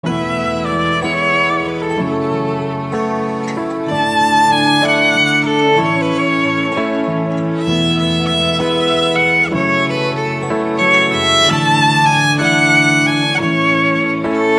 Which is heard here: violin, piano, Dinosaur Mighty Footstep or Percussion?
violin